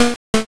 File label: cough